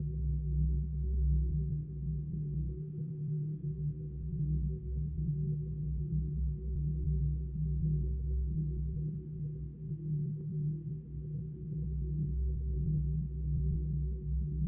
Emmiter Crystal Idle; TANK; 3Souls

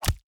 mob damage

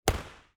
archimage projectile

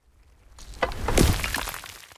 npc stone golem big strike

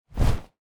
Unstable Bat Wings Flap; TANK; 3Souls

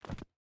mobs landings

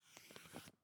ambience room shadow room working layer

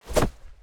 object grab textile

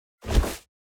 crusader strike whoosh

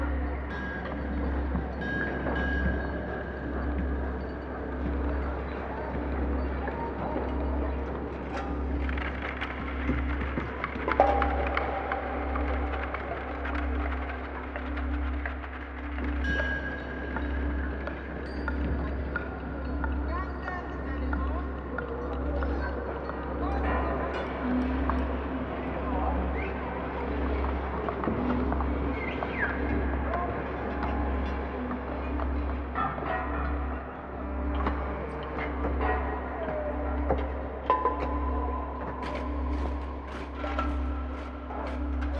ambience room portal light loop

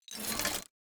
environment gate up

